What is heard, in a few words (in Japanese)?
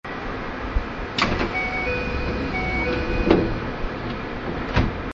ドアチャイム 清澄白河駅発車時; 都営大江戸線の車内放送です。清澄白河始発の電車で収録しました。; 風の音が入ってしまいました。